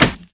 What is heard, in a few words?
SD1, Dance Mega Drums; Best Service